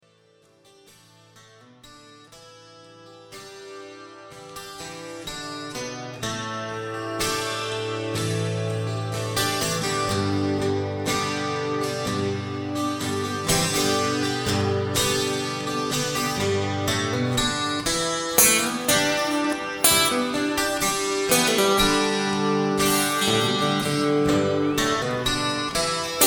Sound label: isolated guitar; Knebworth 1989